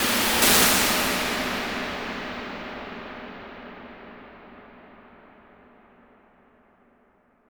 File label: Long Decay, FX; Sonic Foundry (Sony Creative Software); Underground UK House & Electro